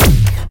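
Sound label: sounds of intergalactic laser battle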